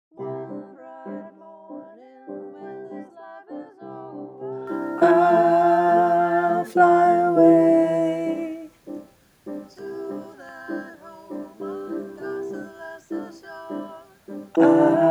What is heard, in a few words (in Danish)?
bas